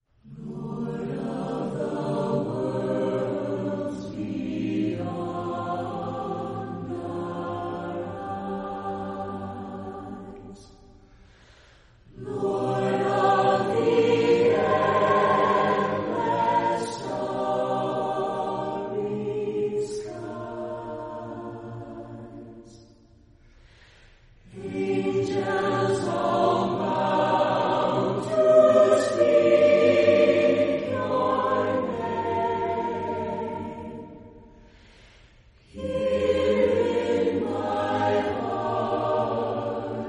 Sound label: Chorale, Voices in Praise acappella